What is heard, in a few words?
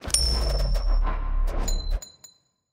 coinflip